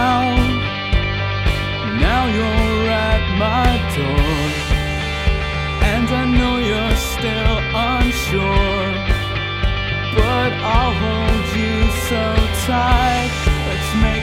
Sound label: 80s Rock; musdb18; Music Delta